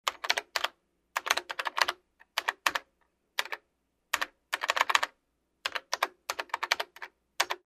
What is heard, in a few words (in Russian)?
zvuk klaviatury